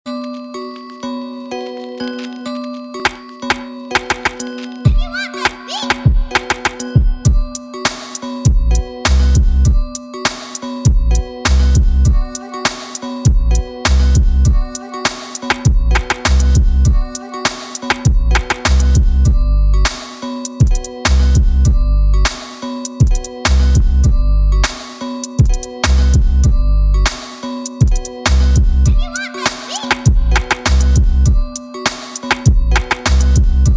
минусочек

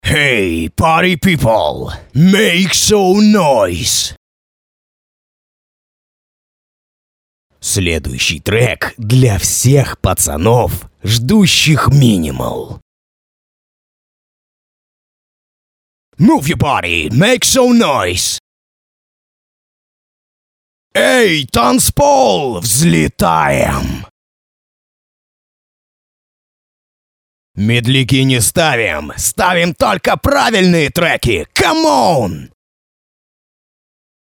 Рингтоны

